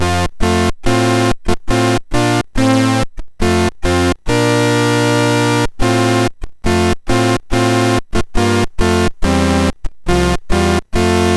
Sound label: BASIC, MOS 6581R4; Commodore Users Guide V2